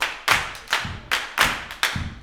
108 CLP FT, Vocal Blues & Soul CLAP FEET

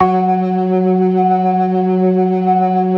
ORGAN 4 DRAWBARS; Keith Emerson Signature Series

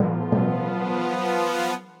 orch sting; musicradar; cartoon caper

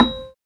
PNO, Electrics; Club-50 - Foundations Roland; Rds & Wurly

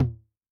Tom, Yamaha DTXpress II

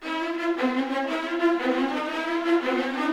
GRO, Orchestral Loops; 128 HITCHCOCK

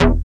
SYN DANCE; Sound & Vision - Gigapack II

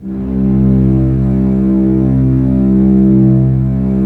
STR SEC, Roland LA Composer, Sec Mute St

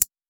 Household Perc

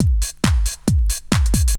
Bite Size Beats; FM 57 - The Ultimate Sample Collection (1997); Essential beats

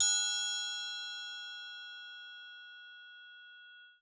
TRIAST; Xsample VOL-9

